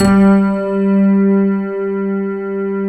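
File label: piano heaven G3; EDENPNO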